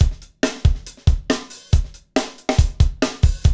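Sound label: beats funk, Roland; Northstar - Drumscapes Roland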